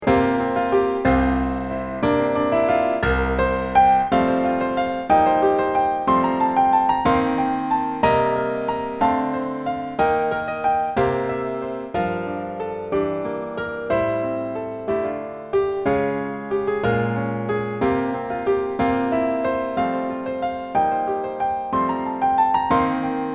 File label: break o sea accomp